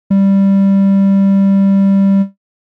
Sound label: Triangle Wave